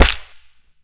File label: hit